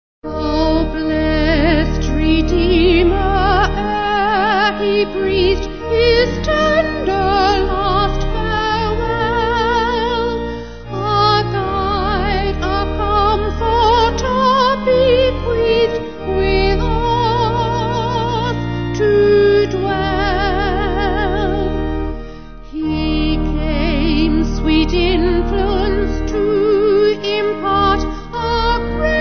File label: Vocal Organ